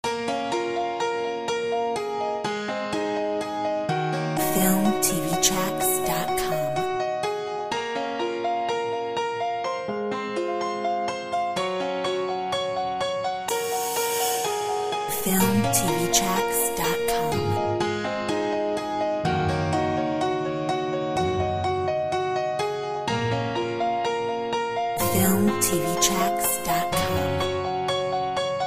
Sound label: perc mix